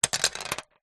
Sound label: leisure toy bingo ball drop out of plastic cage into board; zapsplat